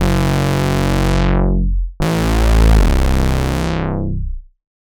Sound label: unison synth sound